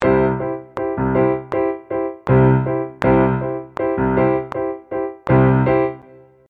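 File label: with click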